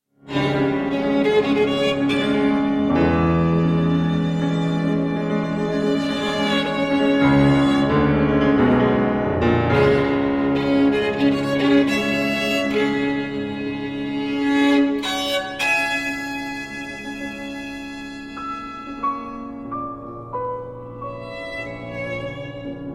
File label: sonata no 2 for violin and piano, poeme mystique